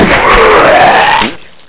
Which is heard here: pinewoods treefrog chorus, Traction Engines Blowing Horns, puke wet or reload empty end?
puke wet